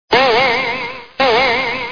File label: disconect; MRSHot2003